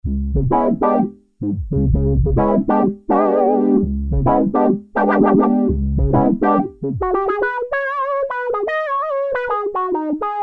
Obi Wah, Korg Poly-61M

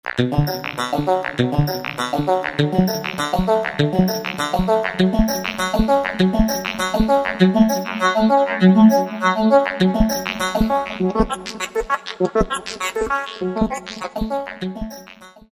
Roland V-Synth Acetate arp; Factory Patches

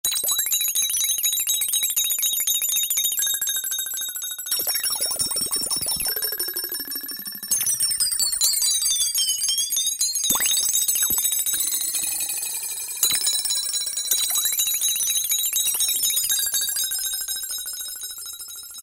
Water Bells, Roland D-110; Factory Patches

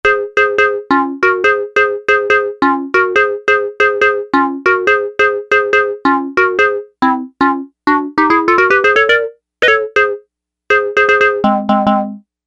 Tech Stab

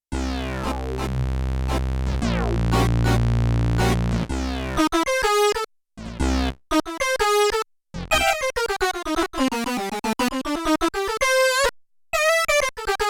Triple Prophet Brass, Prophet 5; Yamaha TX16W

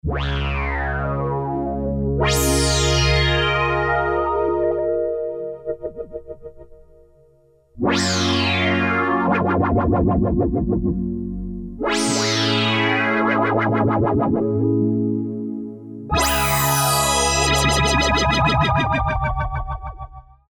Synthe Sweep, Korg Poly-61M

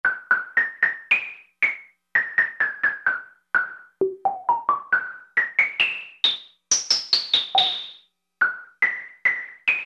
Percussive Sound 1, Roland Juno-60; Factory presets

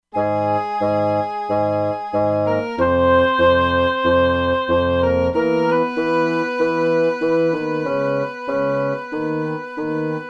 Wind Ensemble; Factory Presets; E-mu Proteus-2

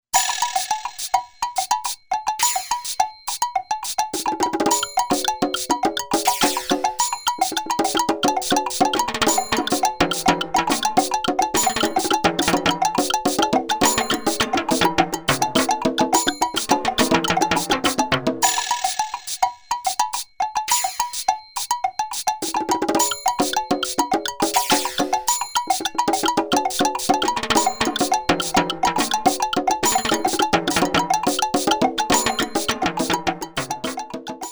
Latin Perc

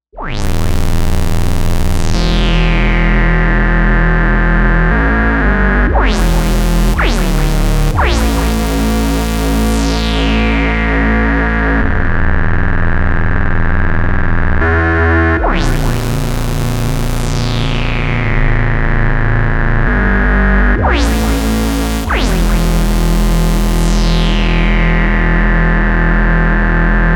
Virtual Drone

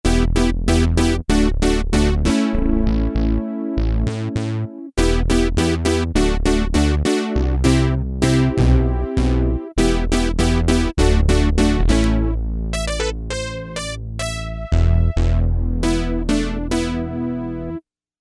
Poly Synth

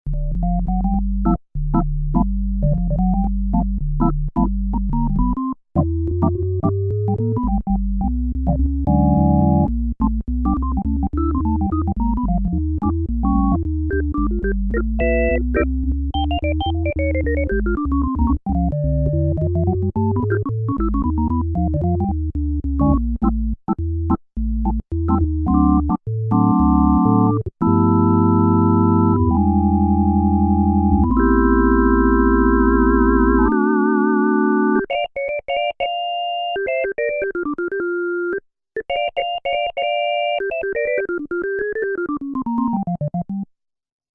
jazz organ, Korg DW-8000; Factory Patches

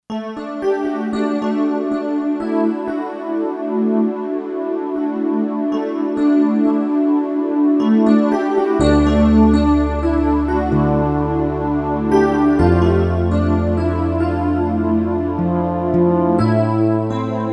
Bass-Bell Pad; Roland SC-880; Factory Patches

